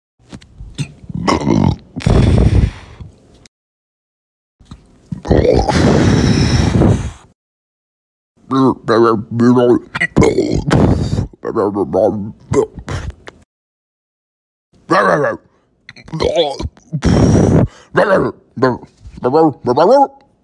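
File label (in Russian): мужчина рыгает и разговаривает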